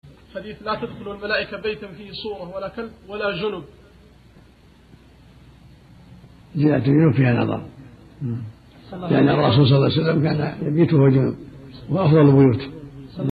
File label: beat